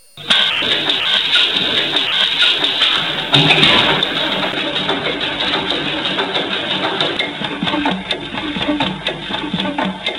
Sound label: Old Diesel